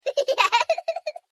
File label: Happy Laugh